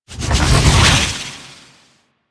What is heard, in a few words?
storm front flip; Warframe; Warframes; Ninja